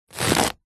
leek raw cut slice knife single

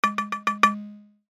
cartoon knocking mallet x5; zapsplat

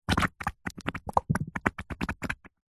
wet mud bubble short; foley

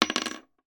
large screw drop on table, industrial

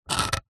office pen biro draw line on wood

